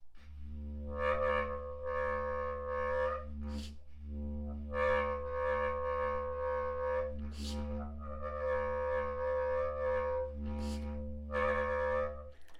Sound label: what happens when i circular breathe